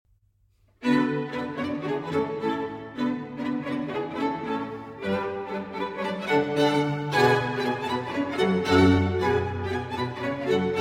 String Quartet in A Major, Finale. Allegro Molto Vivace